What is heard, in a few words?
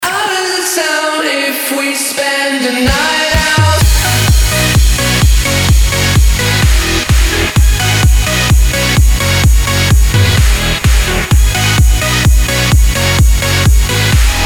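jingle; otbivka 2014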